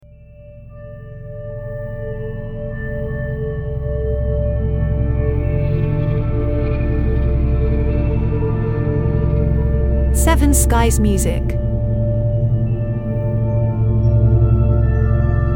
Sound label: Ambient Reflextive Deep Shimmer